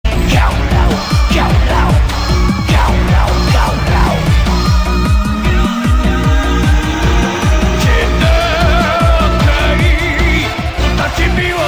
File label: Remix